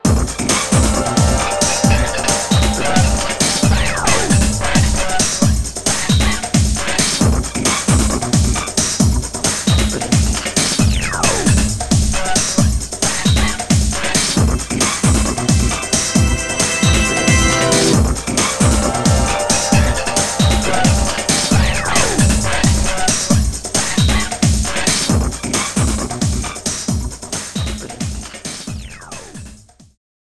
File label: BoomBox loop